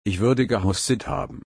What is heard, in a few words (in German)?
husten